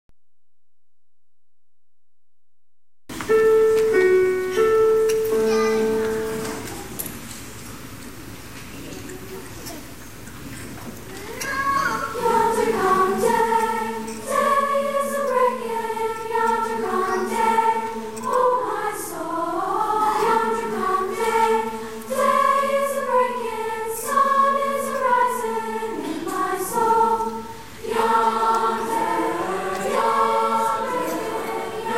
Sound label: mixed choir, yonder come day